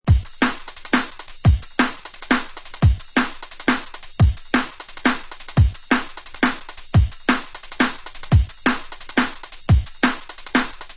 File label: Dread Simple Steppaz, beats; ray keith dread jungle breaks vol 1 60 beats for dubplate clashes battle junkies